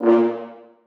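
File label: horns staccato a#2; Sonatina Symphonic Orchestra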